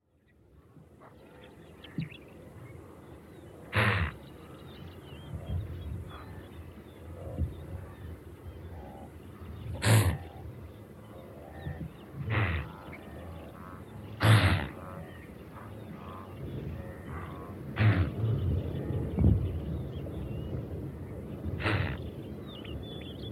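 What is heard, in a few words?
antelope voice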